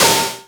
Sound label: NOISE, Elec. Percussion